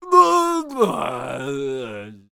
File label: Banner Bearer Death Vocals